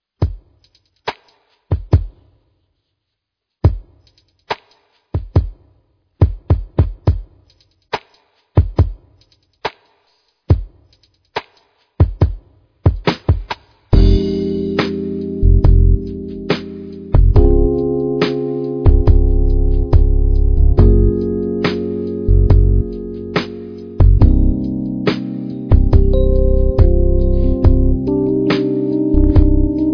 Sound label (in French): chant